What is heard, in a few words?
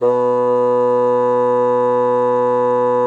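bassoon mezzo B2; Xsample VOL-6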